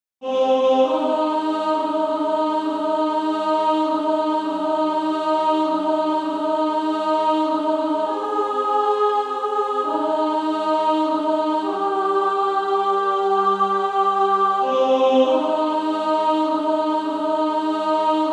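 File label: Female Choir, soprano 2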